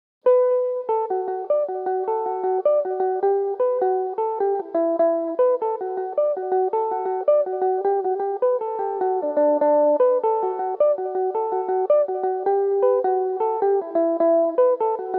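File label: jig D major belmuse, irish mandolin; regans jig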